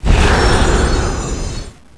exp frost; cstrike

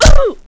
bhit flesh

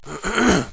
cough; bot; cooked

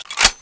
mk48 clip in

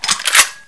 m24 draw

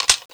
uzi clip in

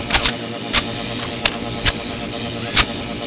stun rifle reload

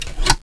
flamegun clip in

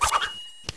knife draw; knife girl

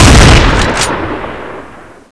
m82 shoot

